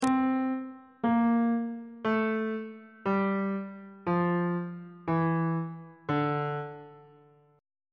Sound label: e double flat minor scale descending on bass clef